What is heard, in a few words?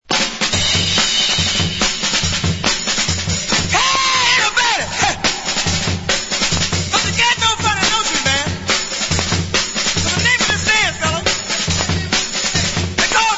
scratch